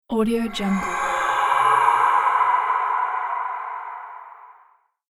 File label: science fiction laser illusion